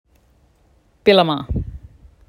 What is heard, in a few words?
Snake necked Turtle